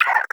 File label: vox idle, creatures, snark